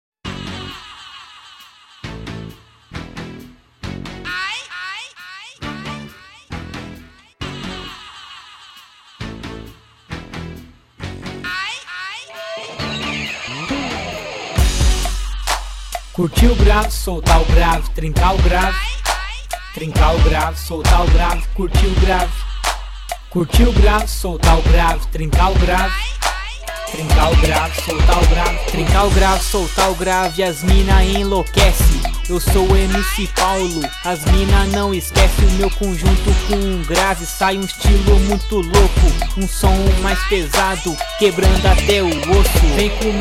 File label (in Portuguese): grave solta grave trinca grave